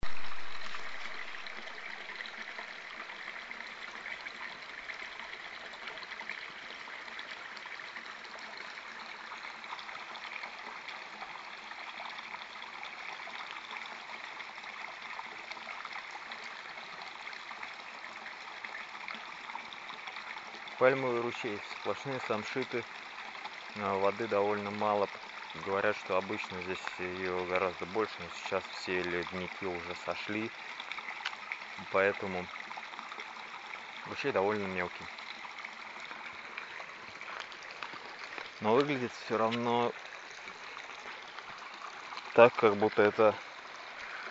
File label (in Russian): ruchei